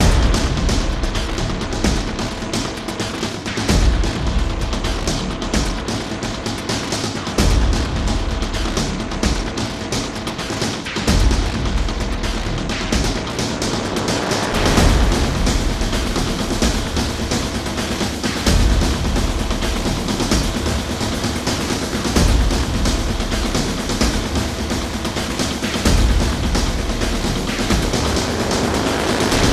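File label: music, synth full drums loop; transformers war for cybertron gamerip; ASSAULT TRYP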